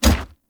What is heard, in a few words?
Heavy Grunt Boot Walk Gravel, footsteps; Characters; Enemy; Sinecure